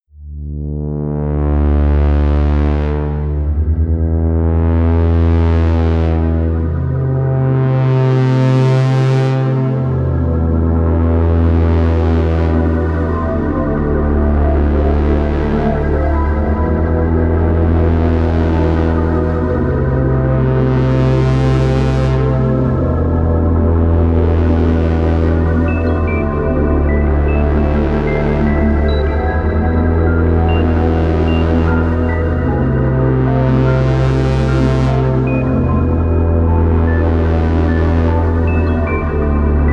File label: Endgame Music; Insanely Twisted Shadow Planet